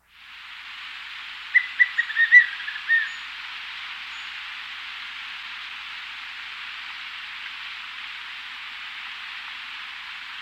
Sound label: Gray-Headed Manakin; S. America